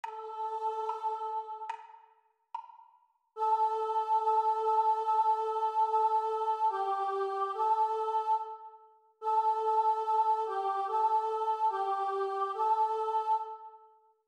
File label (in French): Chant Vx Seule